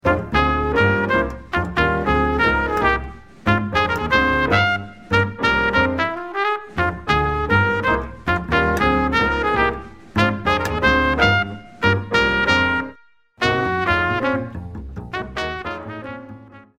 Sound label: minus Drums